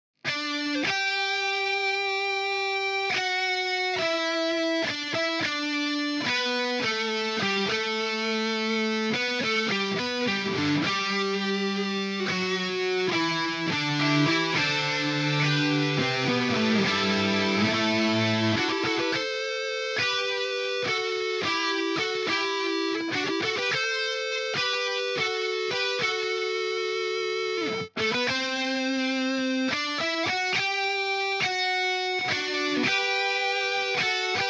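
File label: rock anthem lead guitar 105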